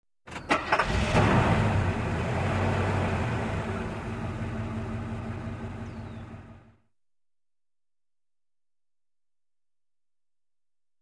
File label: Old Car Engine Start